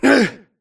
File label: grunt hits; Titanfall 2